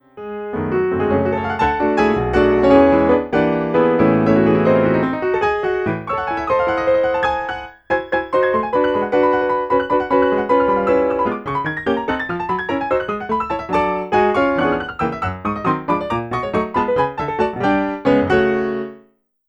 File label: shy rachmaninoff dry